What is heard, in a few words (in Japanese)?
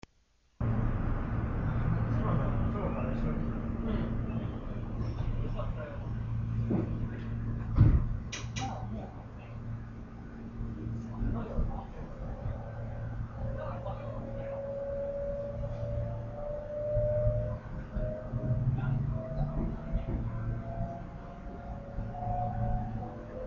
3500系, 名鉄初の編成全体でGTO-VVVFインバータを使用した車両です。; 3500系上野間〜富貴（知多新線）